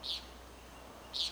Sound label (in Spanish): canto spedrito, San Pedrito de Puerto Rico; hembra